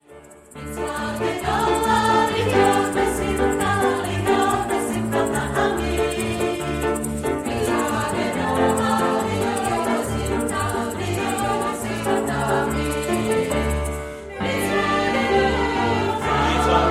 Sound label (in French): ensemble et chorale; hora chadera; mispacha